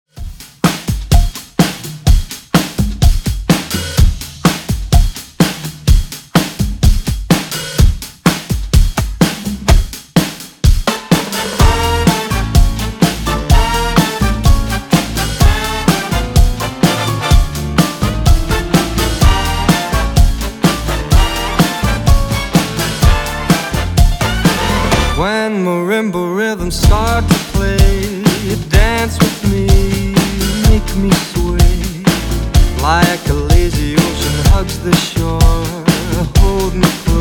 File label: 126 Bpm; Redrum